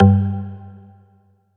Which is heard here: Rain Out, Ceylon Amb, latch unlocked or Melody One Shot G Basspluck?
Melody One Shot G Basspluck